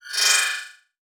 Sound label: Animation Electronic